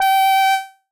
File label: G6 long